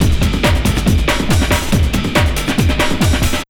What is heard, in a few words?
Big Beat Drumloops, SPEED 140